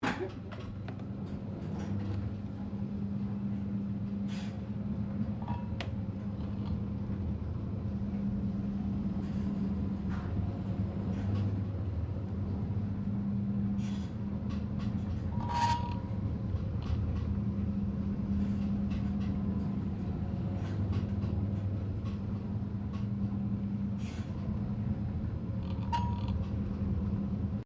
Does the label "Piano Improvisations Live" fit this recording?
No